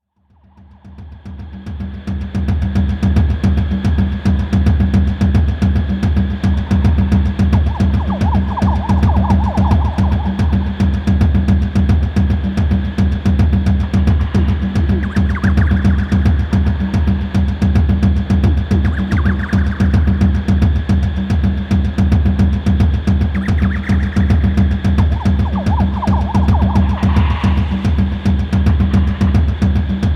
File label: Jungle Drums Interlude